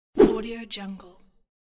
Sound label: cartoon whoosh